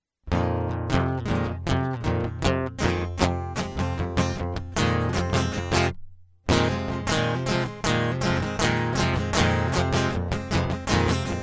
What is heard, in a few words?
Guitar Loop